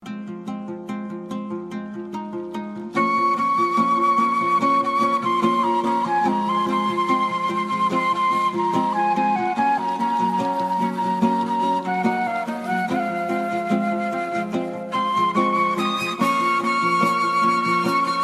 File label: karadeniz ringtone